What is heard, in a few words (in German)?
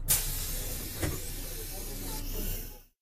bus tuer; minecraft